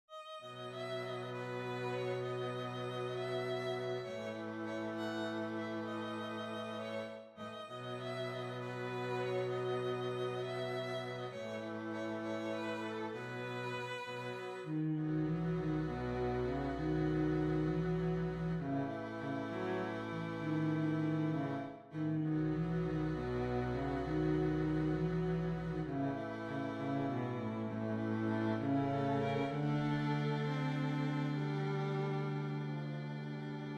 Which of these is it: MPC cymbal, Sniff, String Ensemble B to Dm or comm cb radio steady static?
String Ensemble B to Dm